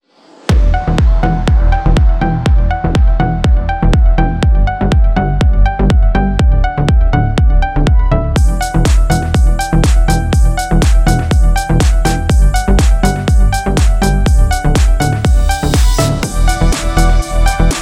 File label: house drums